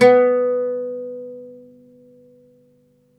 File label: OUD B2 -R; E-MU Producer Series Vol. 7 – Old World Instruments